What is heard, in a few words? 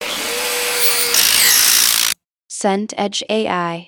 angle grinder metal